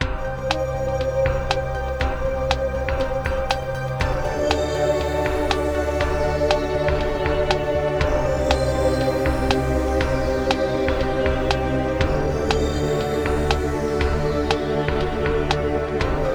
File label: Countdown Looping; sci-fi